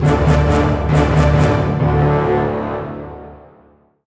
music extra ball; Hercules

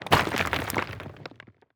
masonry break; teardown; ps5 windows xbox series xs

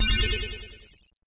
Coin; The Grid

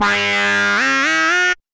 Beat

